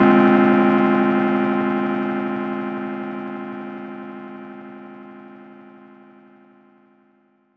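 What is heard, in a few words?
Fm chord Salt Float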